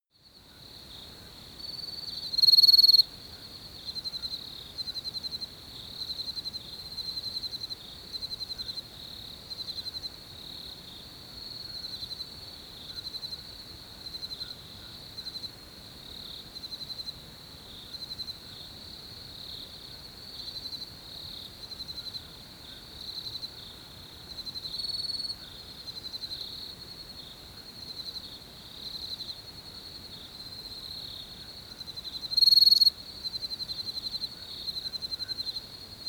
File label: water hole night